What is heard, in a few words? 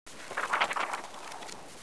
collide; redden